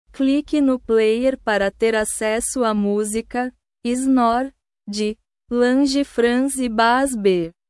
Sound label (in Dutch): snor